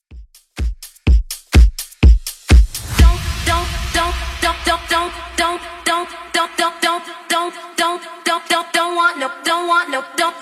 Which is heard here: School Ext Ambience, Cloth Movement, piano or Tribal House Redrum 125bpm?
Tribal House Redrum 125bpm